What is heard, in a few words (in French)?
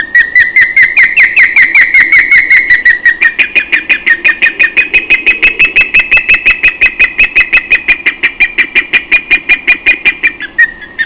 balbuzard